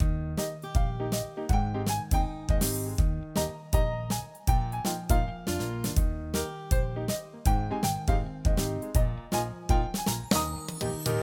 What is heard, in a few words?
bgm; fish